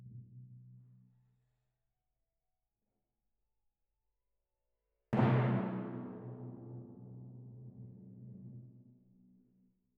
Timp

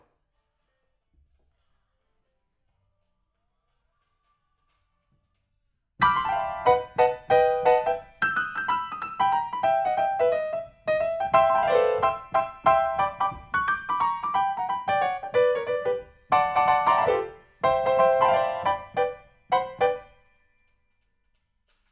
PIANO, RNR for piano

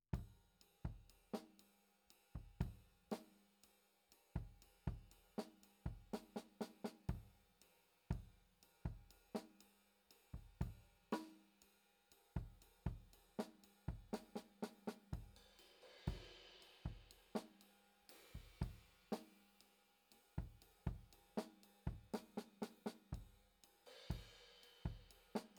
KIT

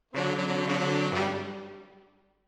MKH AB, piano

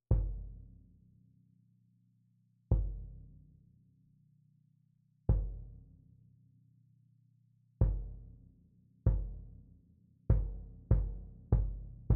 Cassa Rear

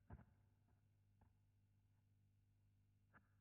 GTR B Solo

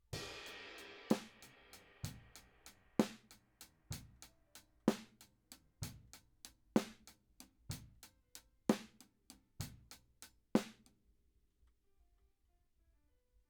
Drums Para

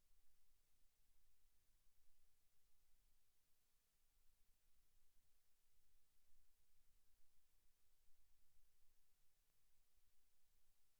Floor

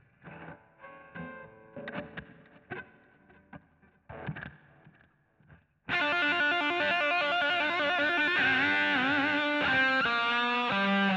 GTR A Solo

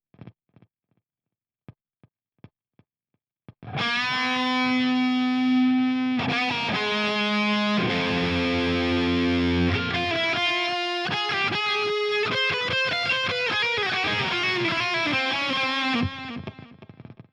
Guitar

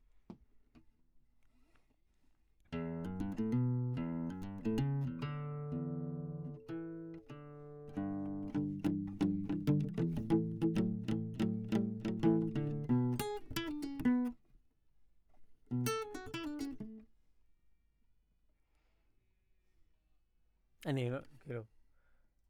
Classic Guitar

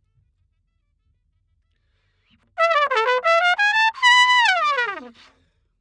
Trumpet

